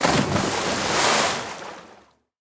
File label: Fall Dwarf water Splash; We Are the Dwarves